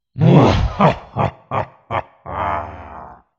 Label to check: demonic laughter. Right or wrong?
Right